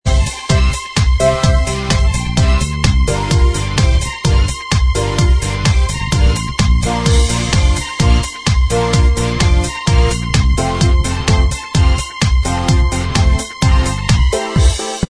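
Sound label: instrumental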